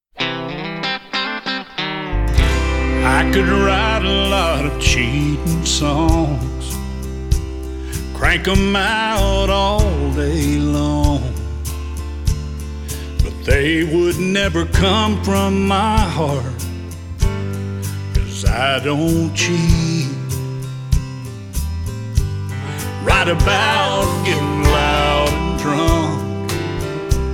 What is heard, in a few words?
country new song